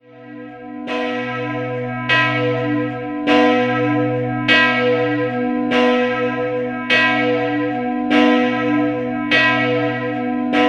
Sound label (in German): St. Michael ob Bleiburg, glocken; Katharina